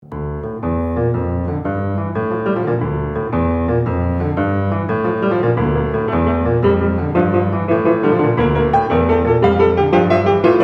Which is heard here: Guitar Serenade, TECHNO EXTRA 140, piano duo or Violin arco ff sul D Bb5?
piano duo